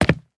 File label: hardwood footstep; metrocraft